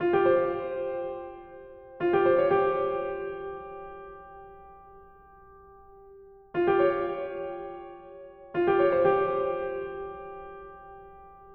Scattered thoughts, piano medly

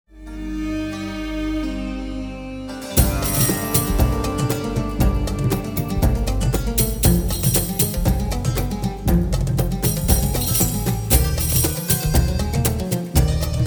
Middle East Rave; Sound Ideas - Dramatic Soundscapes

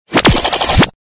Galery Noise; Sound Ideas - Galery Noise 002

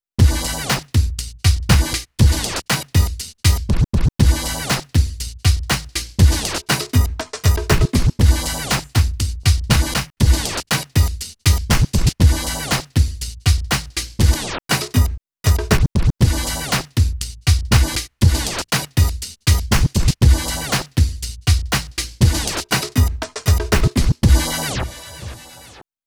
drunk mariachi band, bed

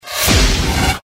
Galery Noise; Sound Ideas - Galery Noise 001

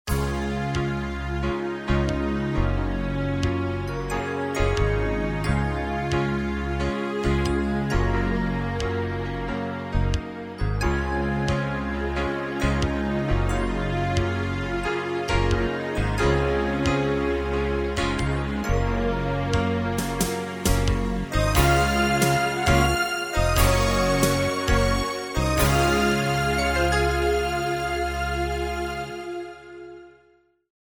Easy Listening & Classical; Sound Ideas

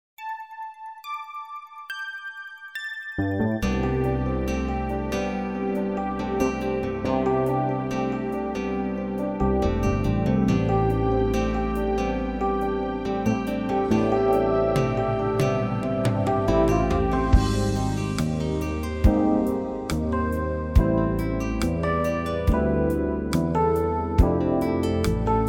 Easy Listening & Classical; Sound Ideas

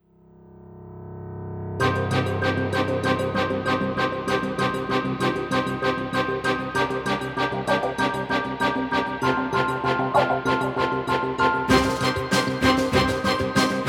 NEWS BEDS

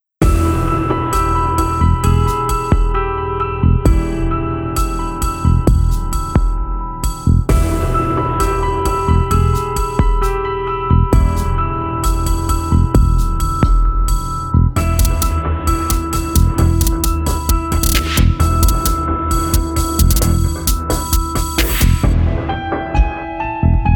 Surgical Precision, NEWS BEDS

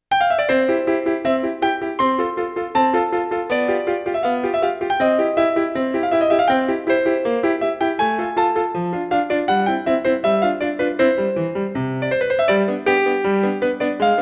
Comedy Style; Sound Ideas - Comedy Styles

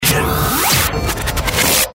Galery Noise; Sound Ideas - Galery Noise 001

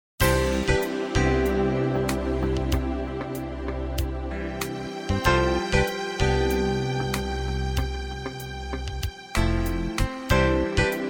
Easy Listening & Classical; Sound Ideas

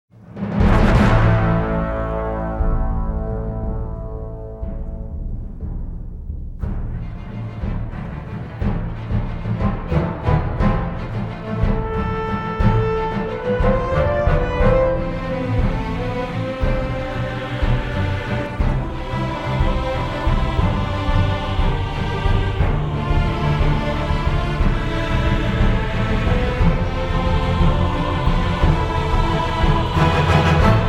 Orchestral

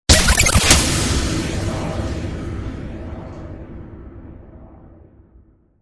Galery Noise; Sound Ideas - Galery Noise 001

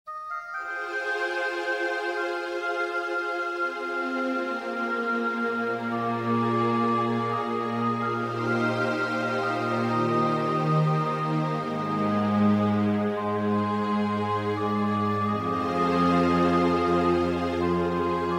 Easy Listening & Classical; Sound Ideas

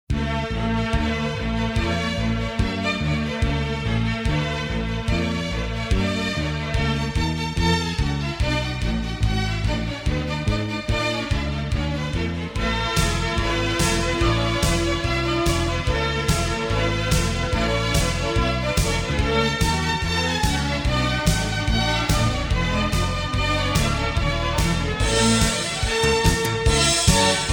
Easy Listening & Classical; Sound Ideas

